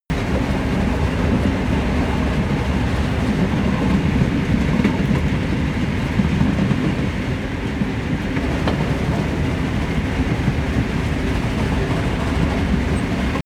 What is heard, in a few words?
train locomotive drive fast loop